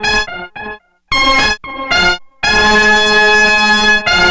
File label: Horns; Sonic Foundry; Stylus Pressure Urban Grooves on Digital Wax; Honk Diggity